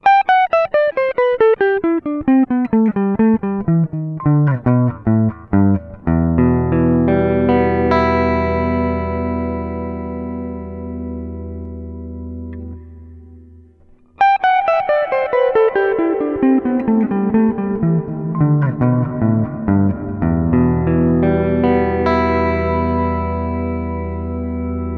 Clean Hall; Nobels REV mini

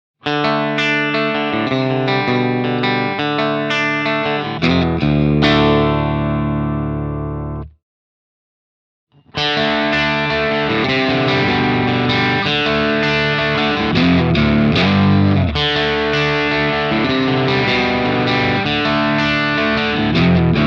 Telecaster Bassman Crunch; Nobels ODR-Mini

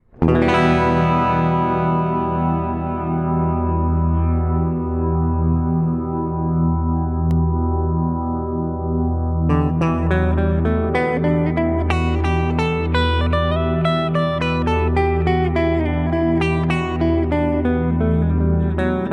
Hall Freeze; Nobels REV mini

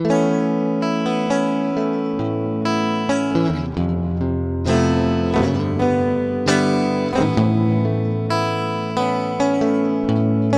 Hall Accords; Nobels REV mini

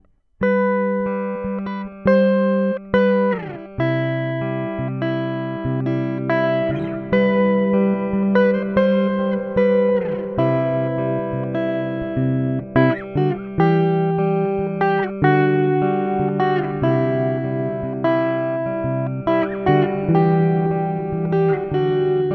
Big Room Plate; Nobels REV mini

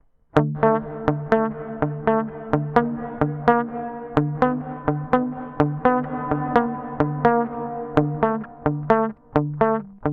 Reflection Hall; Nobels REV mini

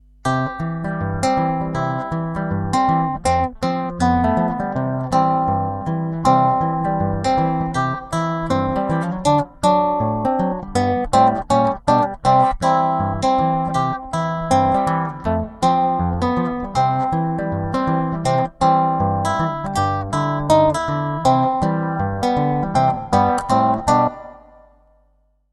A Guitar Spring on off; Nobels REV mini

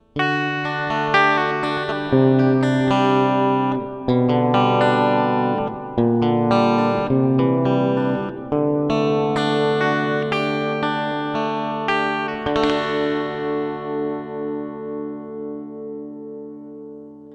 Clean Plate, rev; Nobels REV mini

